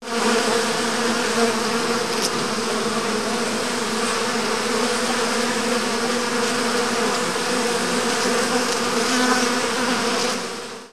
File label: bee hive; Animals